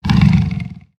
Slam Growl; creatures organic

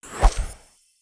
Summary Whoosh; aronwk collection; ndaudio

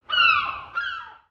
seagull one shot

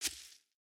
Foot Grass Walk, footsteps

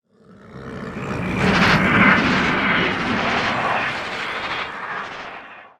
dark jungle oneshot

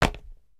catch; cinematics; Pet Rock; Coalessa

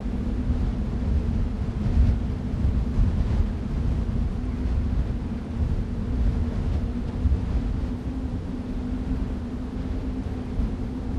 Wind Winter

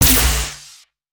Barrier Shock, ambience spaceship

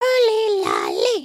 train sit, emotes

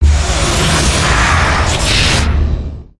Vanquish Blast; Sorcerer

